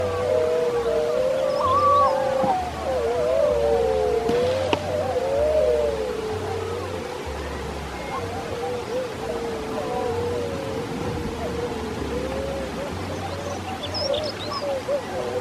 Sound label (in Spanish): aullidos